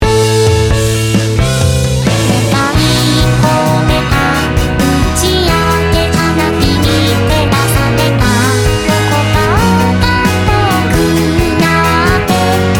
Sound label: non sidechain